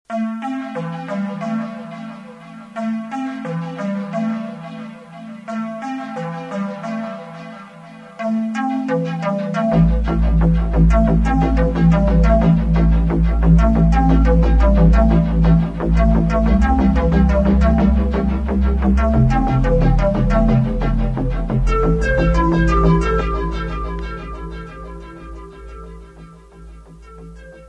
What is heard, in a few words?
CALLIOPE